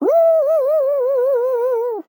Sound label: player fall; fallguys